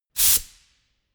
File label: pneumatic pump